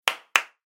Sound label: hand clap